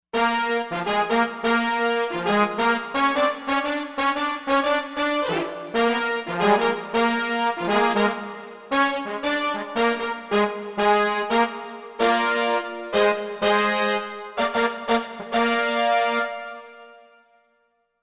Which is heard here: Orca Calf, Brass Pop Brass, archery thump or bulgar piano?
Brass Pop Brass